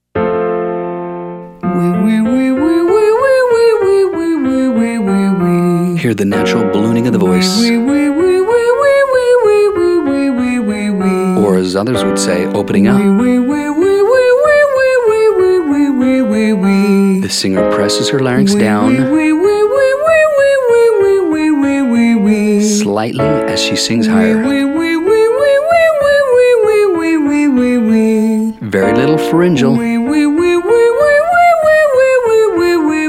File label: Exercise Oui female